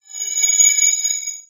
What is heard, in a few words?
Hand Scanner; Sci Fi; 1001 Sound Effects